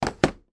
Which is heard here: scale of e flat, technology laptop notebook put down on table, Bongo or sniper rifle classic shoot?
technology laptop notebook put down on table